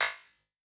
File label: METAL CLAP; Crowdsourced Sample Pack; Drum Kit; Claps & Snares